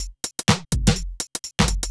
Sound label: HOUSE BEATS 125